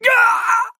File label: pain death; Trapper